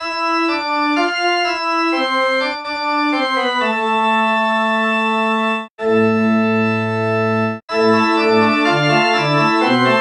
church organ

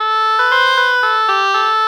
oboe oboe lpc true melody morph

